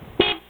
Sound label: horn, prius